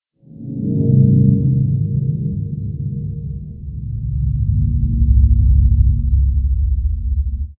breath; temple

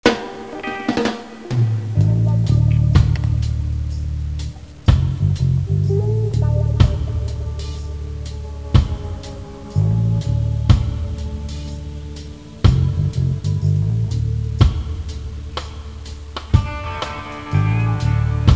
lounge; diFire

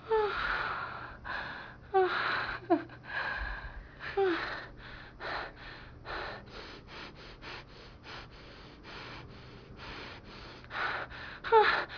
orga

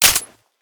five seven slide, weapons; kuilu

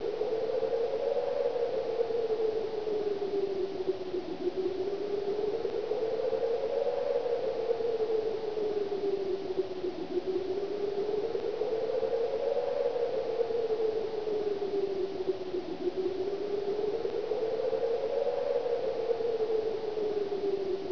wind; de cscream

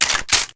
glock clip in right; weapons; kuilu

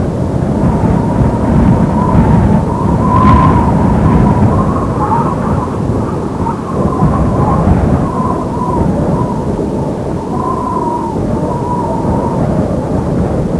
blaze wind; de port arctic

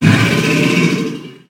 attack; npc; repeater

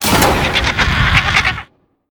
textbird

